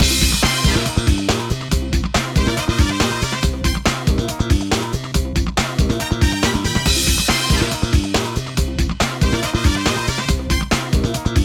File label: jingle result; Taiko no Tatsujin Drum 'n' Fun